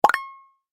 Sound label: Mine; Google Snake; Minesweeper